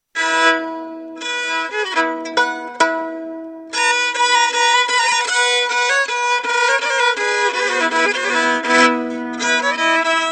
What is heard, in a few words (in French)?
violon hardanger